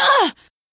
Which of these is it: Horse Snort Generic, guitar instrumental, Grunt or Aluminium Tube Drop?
Grunt